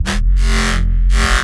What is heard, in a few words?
Reese Bass F; Antidote Advent